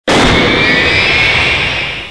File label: button